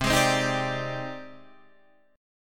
Ab Major Sharp 11th C

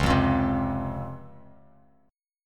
Db Major 7th, Bass Standard; strummed; JGuitar

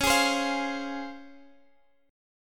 C sharp Major 7th, Guitar, Half Step Down

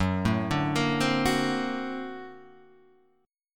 A sharp Augmented Major 9th F sharp

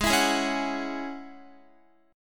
Ab 7th Suspended 4th Sharp 5th Ab down Guitar

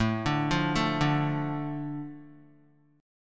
A Suspended 4th Sharp 5th down Guitar; Asus4#5; arpeggiated; 4F,4A,5C,5G,5C,6E; JGuitar

